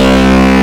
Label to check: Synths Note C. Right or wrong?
Right